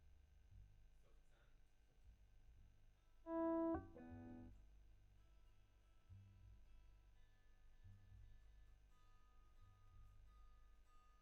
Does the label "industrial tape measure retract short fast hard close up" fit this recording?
No